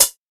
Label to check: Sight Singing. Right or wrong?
Wrong